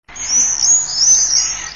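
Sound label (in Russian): chernyy strizh golos, common swift voice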